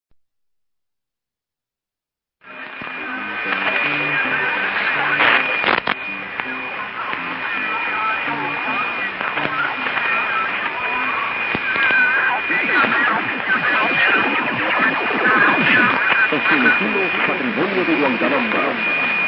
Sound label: 6282 kHz; Peru; R Huancabamba